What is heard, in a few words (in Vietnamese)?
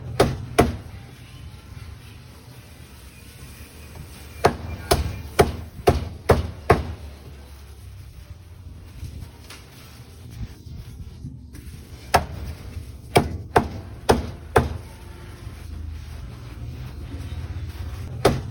tiếng chặt thịt gà; tiếng chặt vịt; hiệu ứng âm thanh chặt thịt cật cật, tiếng dao phập vào xương, tiếng chặt dứt khoát